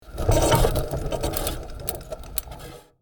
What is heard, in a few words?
Rusty bicycle rotating clicky mechanism